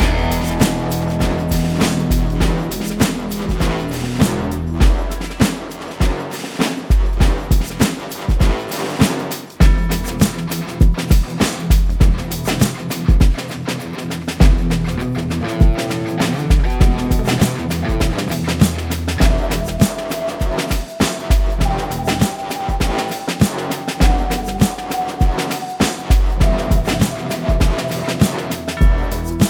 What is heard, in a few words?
Build Phase Lastminute